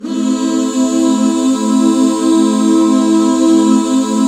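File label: A minor, back chords; Sound & Vision - Gigapack II CD 1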